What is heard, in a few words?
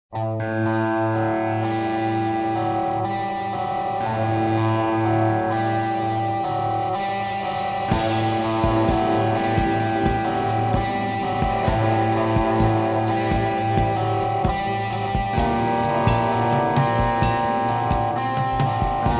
electronic guerilla